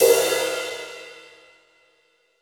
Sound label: New Brush Crash, Cymbals; Roland BK-5